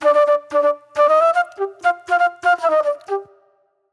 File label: Movie Flute; Sonic Foundry (Sony Creative Software); Manhattan Flutes; Super Brown